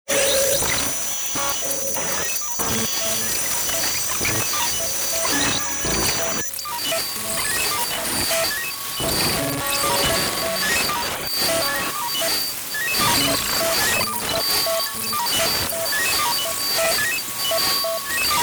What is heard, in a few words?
Computer Malfunction, Scifi, Computer, Malfunction, Glitch, Sequence, Science, Fiction; BlastWave